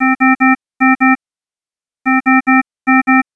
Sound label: Di Di Di Di